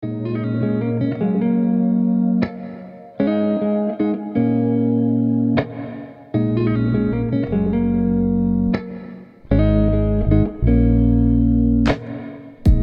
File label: study music